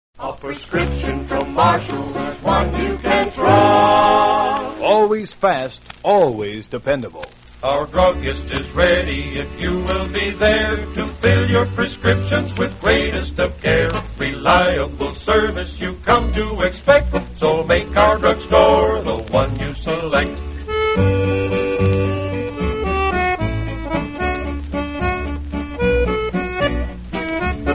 radio jingles; marshalls drug store; allied radio artists

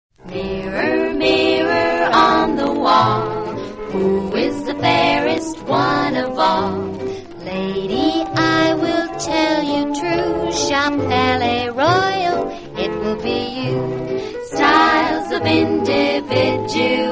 radio jingles; palais royale; allied radio artists

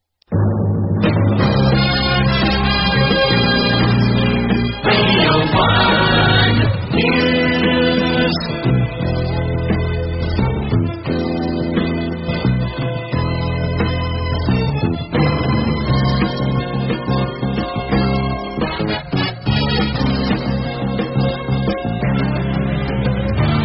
radio 1 jingles news

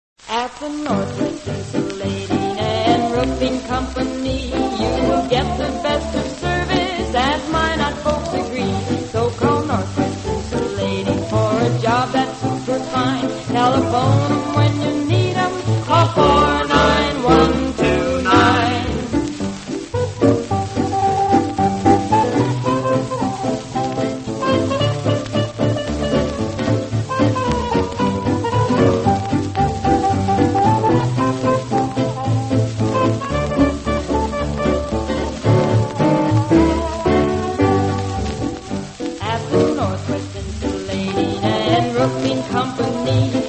radio jingles; north west roofing; allied radio artists

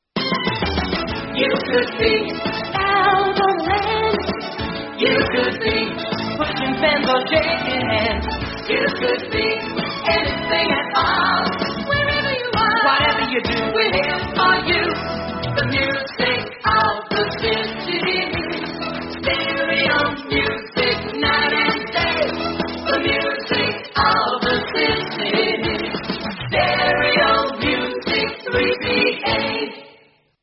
jingle; australian radio 3ba

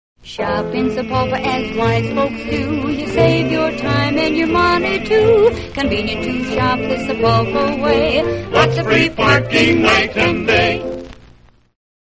radio jingles; sapulpa; allied radio artists

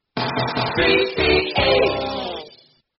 jingle; australian radio 3ba

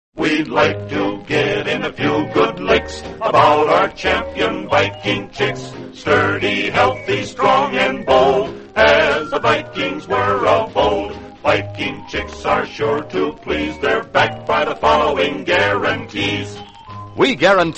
radio jingles; viking chicks; allied radio artists

